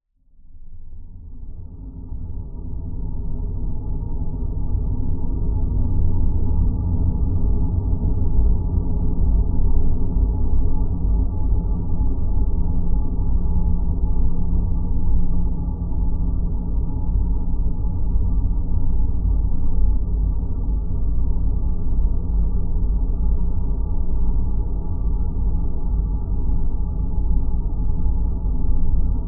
sound design drone dark desolate airy could be spaceship interior; zapsplat